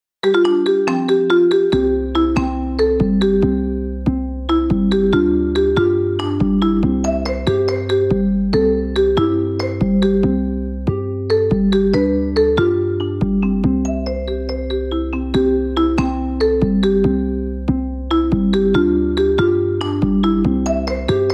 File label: samsung alarm marimba remix